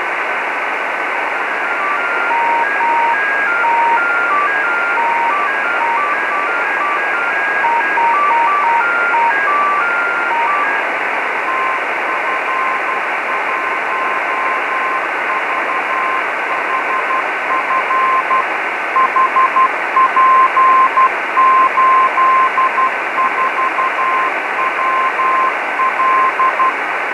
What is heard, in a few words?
4m beacon auroral e; tf1vhf